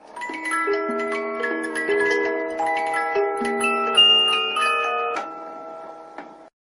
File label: mystery jingle